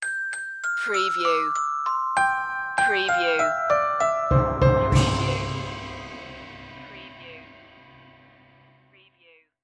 scandinavian light stinger

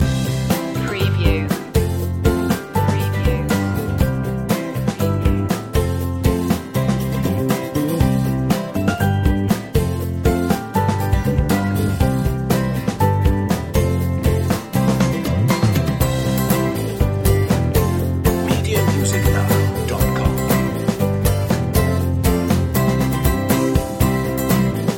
born to be happy loop

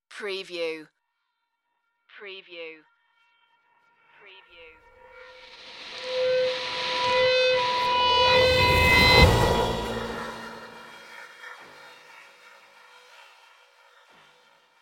abstract passing mystery

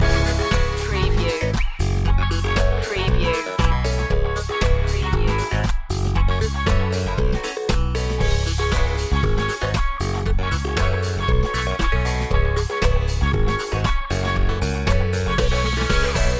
fill the floor loop

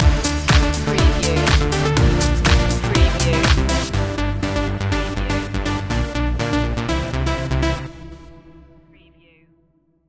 ibiza summer stinger

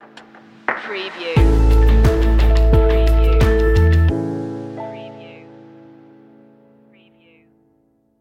in a warm place stinger

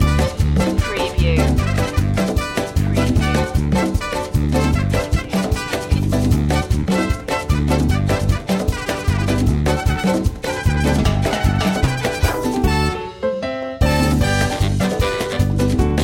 merengue

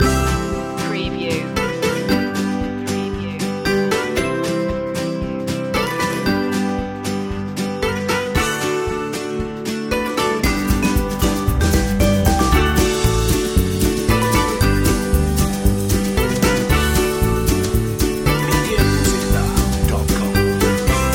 greet the day loop

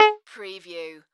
Multimedia Error

